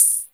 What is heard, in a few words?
PERC, 160 BPM; Ueberschall; Drum & Bass Flash Trackz